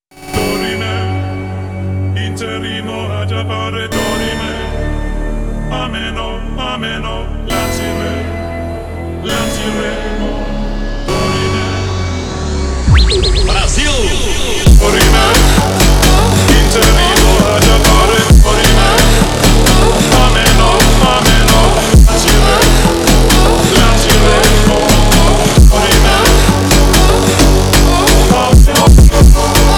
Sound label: funk